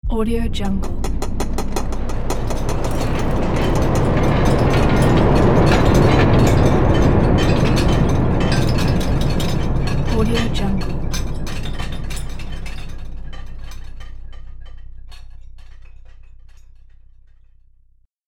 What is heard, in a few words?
earthquake noise; شروع زلزله